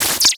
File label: Animation Electronic; Adobe; Multimedia Internet CD-Rom Flash